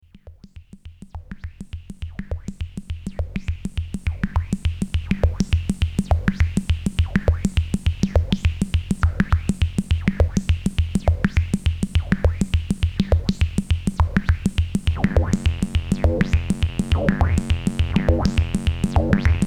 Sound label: Sunday afternoon. Variations of MS20 patch. No sequencer, no pressing keys, just using the patch bay to generate sounds. The odd tweak to the quad too